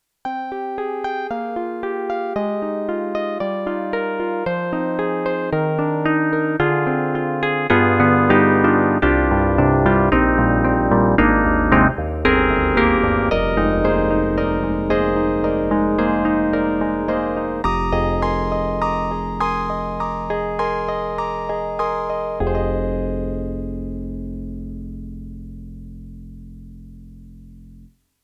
Yamaha MU1000EX Nasal DX

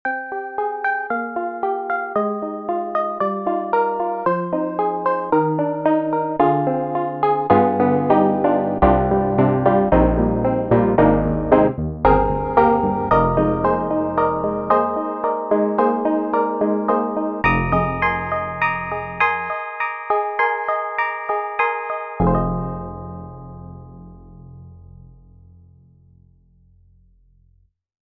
FM Honky Tonk; Steinberg HALion 7

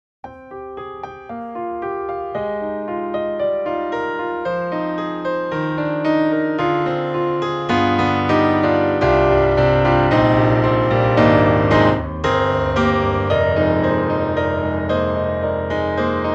Evolution Rosewood Grand; Orange Tree Samples; Them Ivories

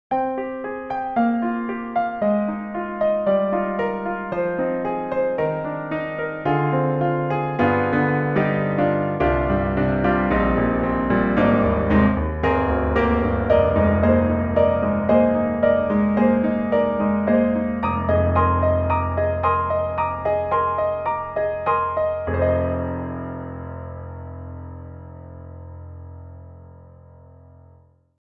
Roland Juno-Gi 88 Key Grand

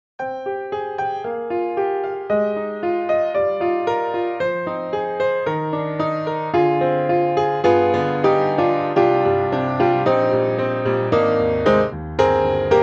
Keys Modern Upright Modern Upright; XLN Audio; Addictive Keys

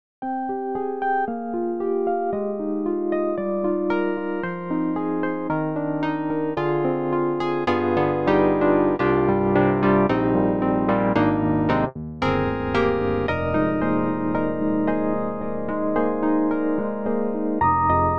EDIROL Super Quartet, Wurlie

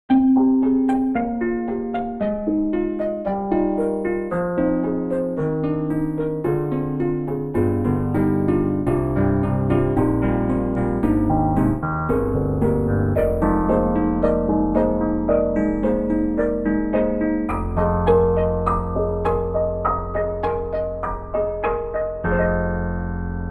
Broken Piano; BrokenBeauty

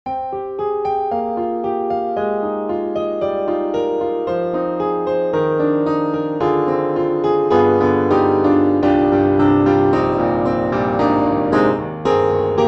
Pianoteq Grand C2 wood; MODARTT S.A.S.

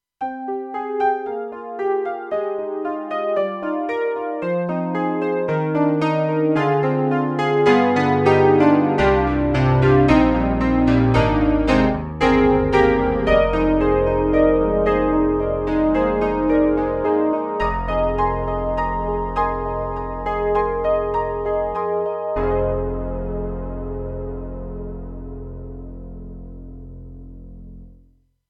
Roland JV-2080 RD-1000

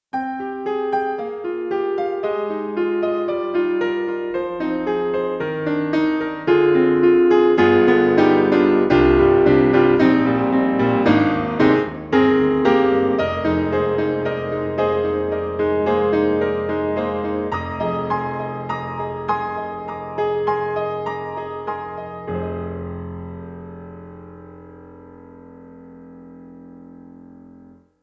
Orchestral Grands Steinway D Mid Mic; Orchestral Tools; The Orchestral Grands

